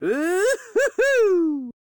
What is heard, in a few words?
Man Woohoo; voice; Zomboid; Lifestyle